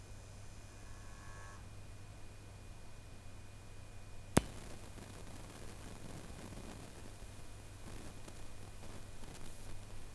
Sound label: noise; bryston